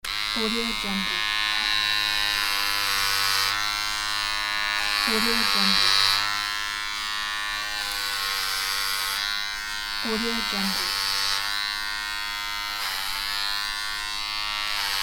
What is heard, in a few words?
hair clipper